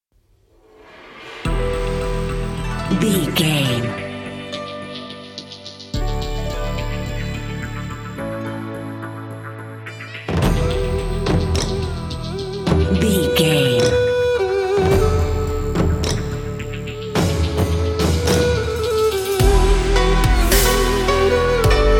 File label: chinese inspirational modern intro